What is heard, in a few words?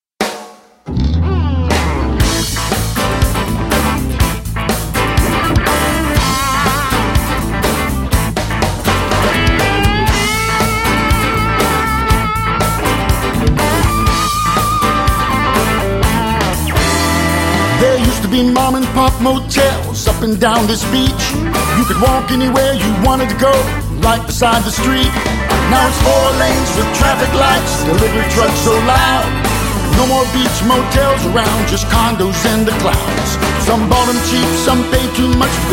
Tropical Country